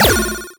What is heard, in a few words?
Bomb; Pac-Man Championship Edition 2 Plus; Zero